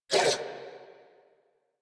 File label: Pain, Female; New War; Narmer Grineer